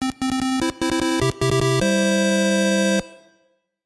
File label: Match Win